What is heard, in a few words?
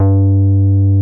Moog hi G2, analog bass; Music Store - Claudius Diemeter Schwerlos